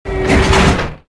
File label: walkway stop; movers platforms